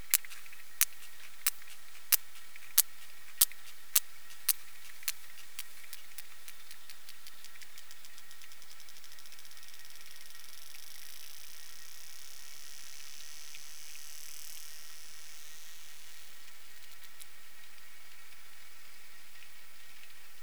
P macrocephalus creak

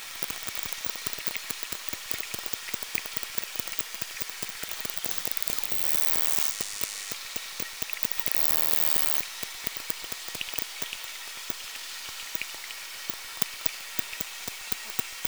G griseus

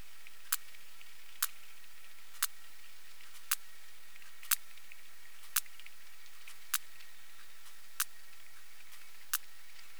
P macrocephalus clicks

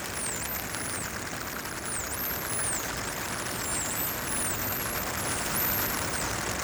S coeruleoalba whistles short

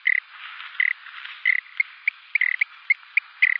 Release call of Melanophryniscus pachyrhynus

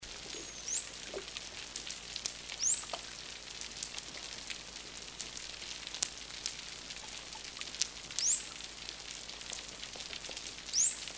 T truncatus whistles

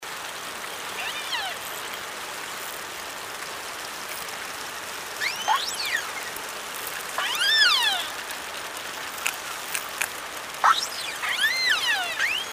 G melas

